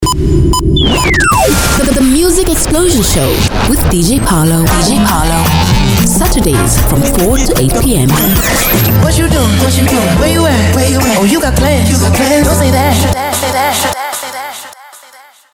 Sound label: Music Explosion Promo Energy; Energy FM – Namibia